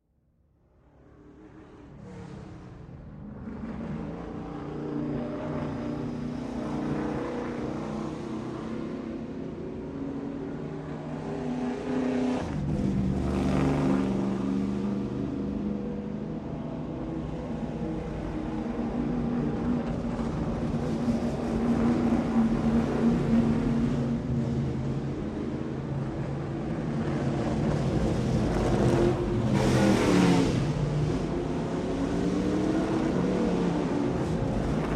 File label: Autos, NASCAR multi-vehicle warmup, 3D binaural; ASMR audio only